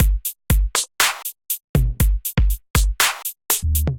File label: Kit, Drums Hybrid; Chelsea Kit; Ableton; Factory Packs; Drum Essentials